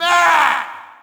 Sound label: Shout, audience; UFC Tapout 2